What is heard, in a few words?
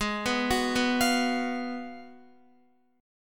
Ab Minor 13th, Guitar; arpeggiated; x,11,11,11,11,13; 4F,4A,5C,5G,5C,6E